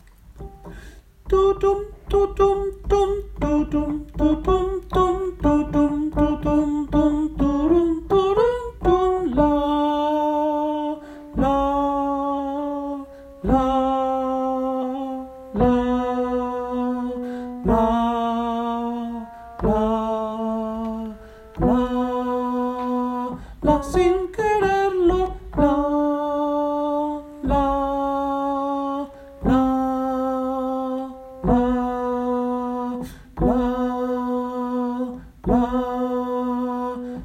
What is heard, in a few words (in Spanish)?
Alto